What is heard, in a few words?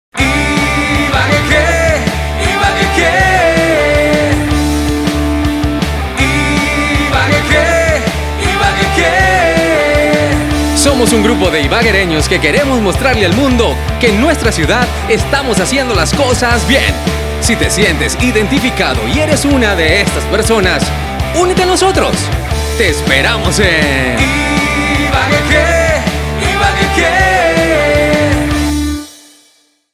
jingle